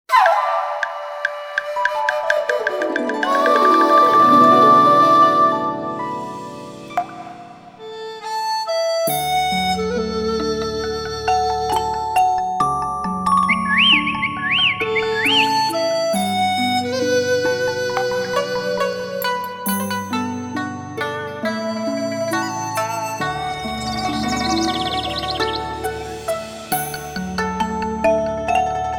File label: Sunrise View Ethno; Vivo X5 Pro; alarms